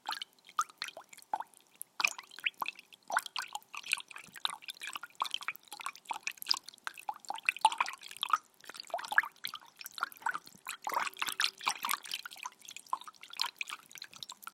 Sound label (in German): Wasserplätschern Badewanne; menschliche laute